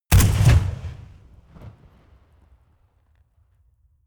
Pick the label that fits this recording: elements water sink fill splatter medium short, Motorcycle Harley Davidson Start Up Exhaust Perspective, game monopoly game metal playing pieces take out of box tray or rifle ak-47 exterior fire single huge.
rifle ak-47 exterior fire single huge